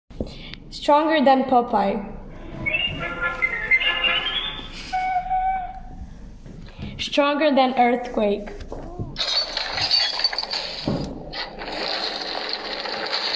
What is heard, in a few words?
auditory song